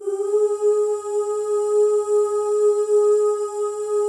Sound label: FEM OOS, Symphony of Voices; Spectrasonics